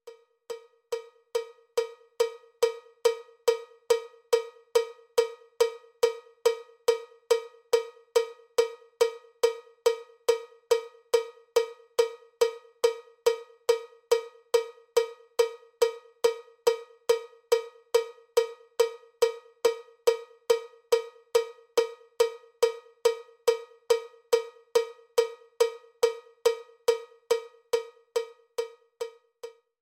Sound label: cowbell click track bpm 79; drumtex; cowbell click tracks vol 1